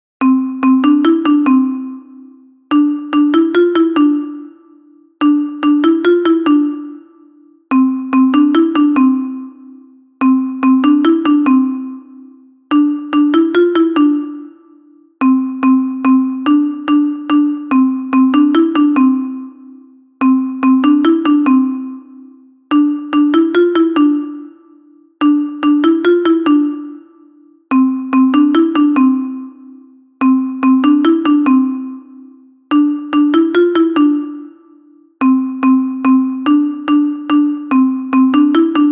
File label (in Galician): Xota Xilofono Contralto